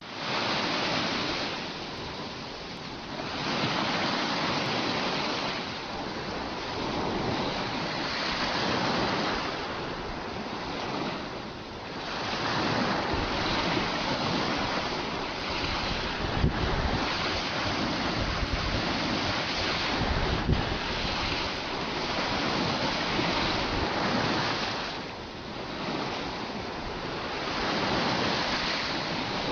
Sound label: Waves